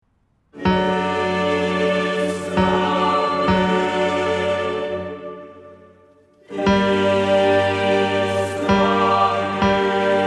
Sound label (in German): Bach Aus der Tiefen, Bass